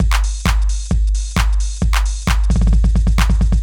Beat Mix, 132bpm; Construction Kits; Big Fish Audio - Old Skool House; OSH Belgium Beats

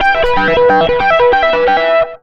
SOLO, Electric & Acoustic Guitar Loops; USB Soundscan vol.04; AKAI; 120 SOLOS

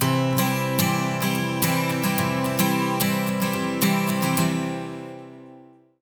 MAJ 12 S, Acoustic Guitar Loops; USB Soundscan vol.04 - Electric & Acoustic Guitar Loops; AKAI

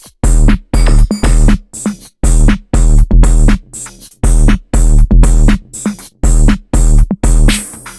trap hip 120bpm, Downbeat Dance Grooves

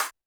Roland TD-20 Percussion

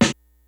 GREASY KIT, DRUMS; Kid Nepro; Elite

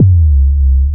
SUB KICK; KICKS; Back In Time Records - Big Bang

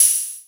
High Hat, Drum Machines

